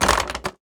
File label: Toilet Paper Dispenser, Bathroom; 1001 Sound Effects